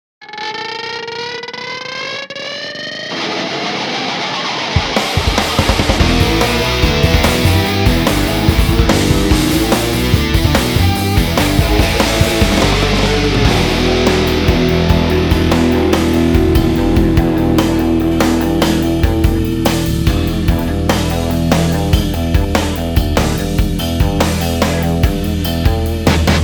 Drums More punch

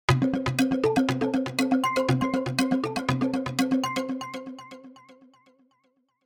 Synth Raw

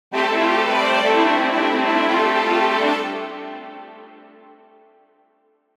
Pulse C

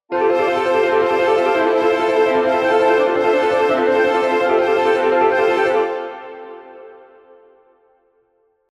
Accomp

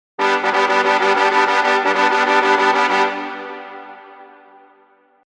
Pulse B

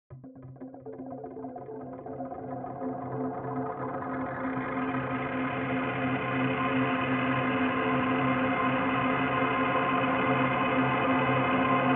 Synth Rotor

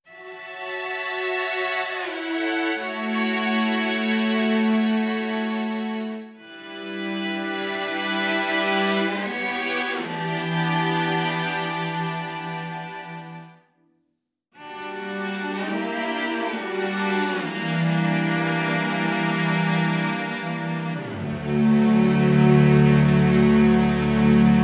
Pimp my orchestra High Strings

